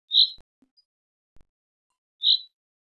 call, chaffinch, the "rain" call typically sung near the nest during the reproductive season - SEX/AGE: adult male; ALTITUDE: +70 m.; fringilla coelebs